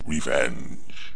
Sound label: got hit; Raft Wars